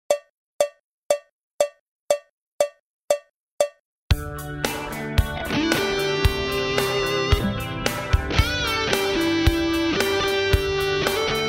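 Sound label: neck high gain, goldtop; wcr crossroads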